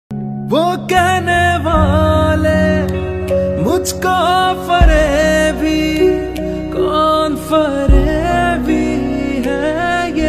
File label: Very Sad Emotional Song Ringtone; ringtones